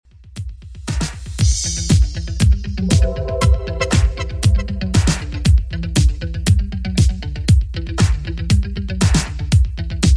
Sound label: 80s Redrum 118 bpm CLEAN